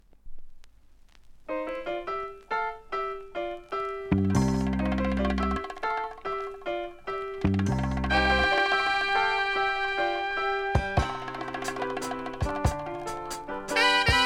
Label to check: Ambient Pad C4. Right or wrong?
Wrong